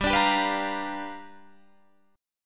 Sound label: G 7th Sharp 9th G, Guitar Standard; G7#9 strummed